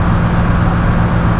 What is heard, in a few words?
auto large engine freeway